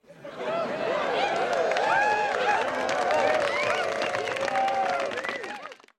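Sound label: Crowd 100 Interior With Whoop; medium int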